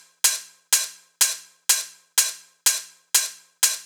Big EDM Hat Loop, 120 BPM; Future House; Future House Tools; Big EDM Drum Loops

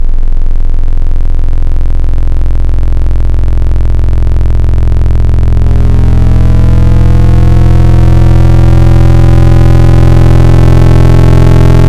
Rainbow Sine, Wavetables; Cymatics; Future House Wavetables; Serum

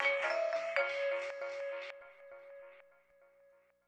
Stab; Thirty-One Flavors

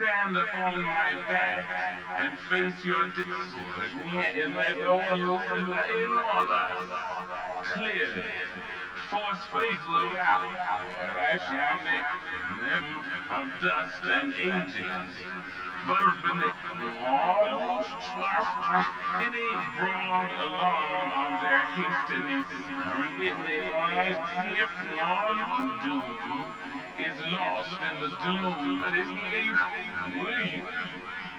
Vox, Emin, Hip Hop

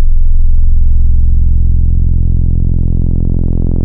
Square Filtered, Wavetables; Cymatics; Future House Wavetables; Serum

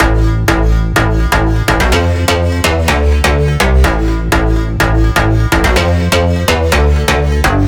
Big EDM Bass Loop A1; Future House; Future House Tools; Bass Loops

